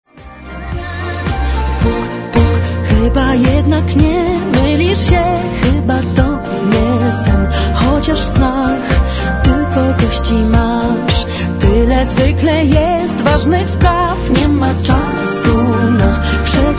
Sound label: ding dong